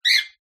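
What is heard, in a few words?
Parakeet Sing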